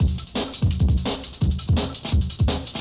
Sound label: God Mode Intro Drums